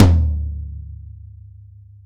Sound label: tom, velocity tom; VELOCITY TOM 1